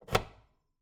Cabin, outer doors, double doors, tension, latches, wooden, screens, slide bolt, wide; Eiravaein Works; Vaeyan IV